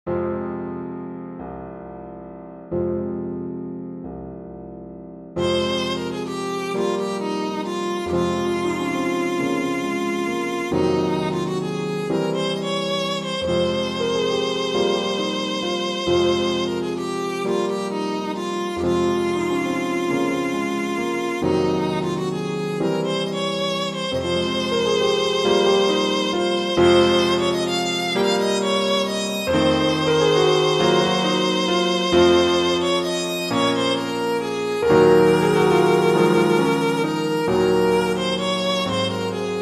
barcarolle for viola piano

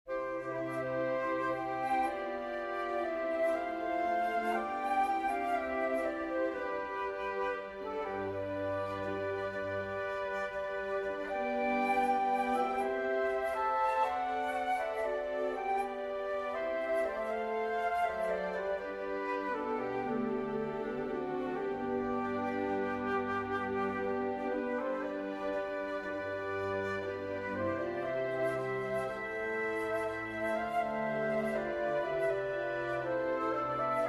ritornello, for winds strings; purcell henry; from celestial music did the gods inspire

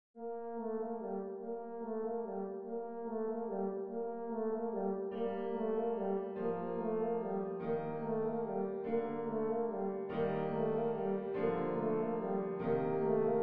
carols of the bells; leontovich mykola

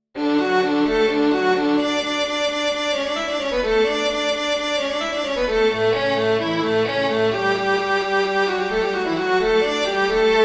for trumpet strings; telemann georg philipp; aria glaub und hoffnung trost und starke

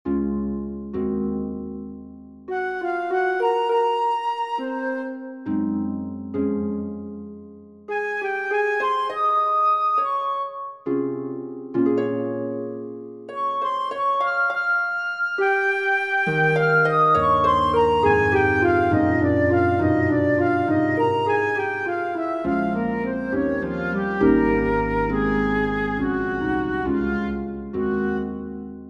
nocturne for flute harp; bizet georges; colin maillard; from jeux 039 enfants

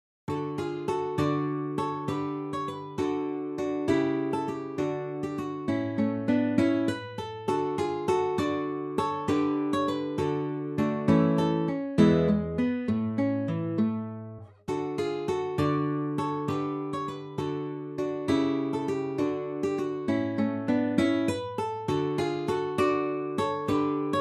sicilienne; Rung, Henrik

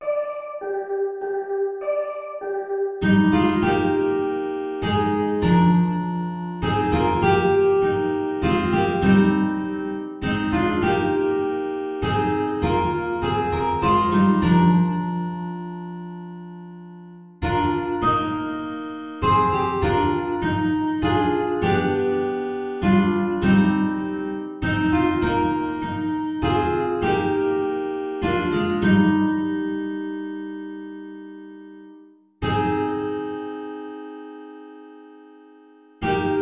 play along without guitar

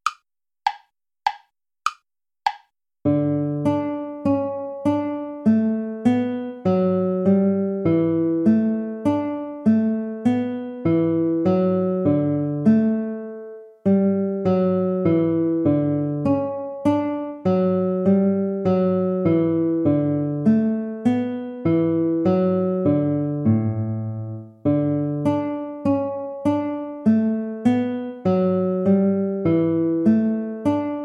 minuet

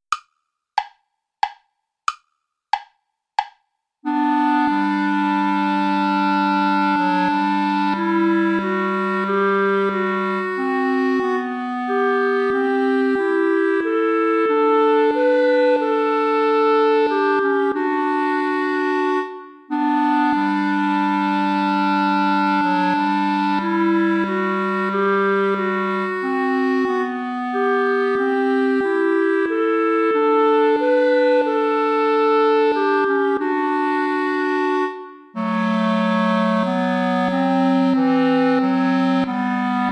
sarabande play along without guitar

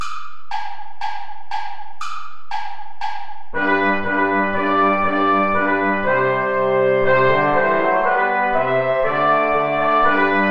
play along without trumpet; steuerlein johann; mit lieb bin ich umfangen

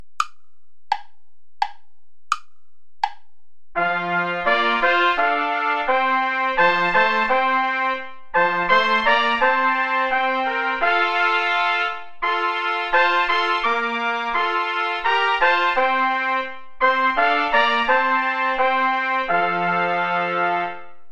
play along without trumpet; lord sing with lips and heart

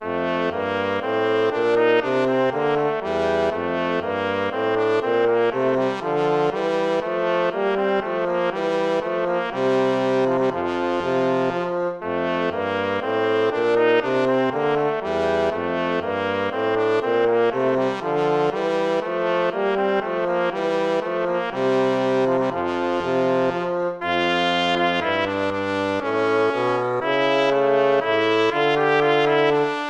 baroque minuet major

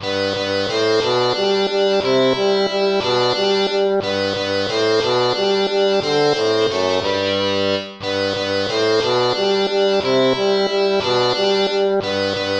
waltz; Carulli Ferdinando

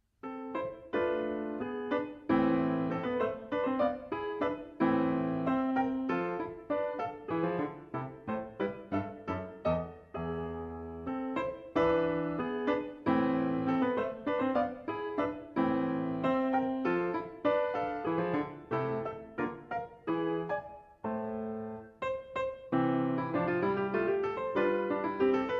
rigaudon; Chaminade Cecile

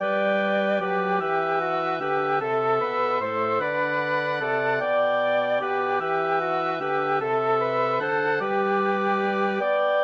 woodwind quartet

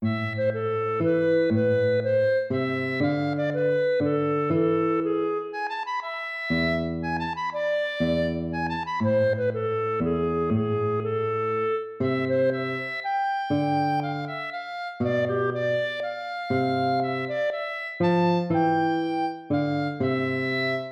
baroque minuet minor

